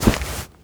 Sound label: grunt foley run